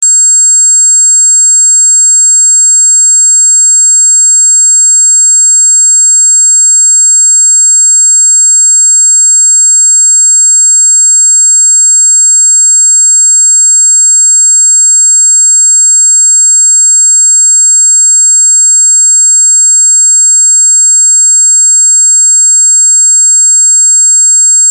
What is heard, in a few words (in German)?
Rechteck 7500 Hz; Rechtecktöne; Ongsiek Haustechnik